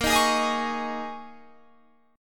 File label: G sharp Suspended 2nd Suspended 4th, guitar chord; 18,18,18,20,21,21